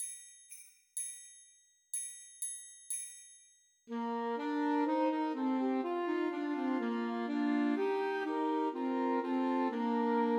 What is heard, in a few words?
Ding Dong Merrily on High Christmas Trios for Flute Clarinet and Alto Saxophone